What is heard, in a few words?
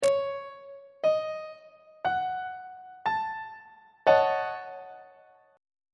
e flat half diminished 7th 3rd inversion on treble clef